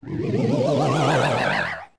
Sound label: Appear; Wizrobe appearing